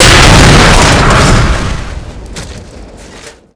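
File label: explosion metal barrel close; Explosions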